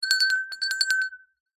bells small hand bell ring in water weird cartoon tone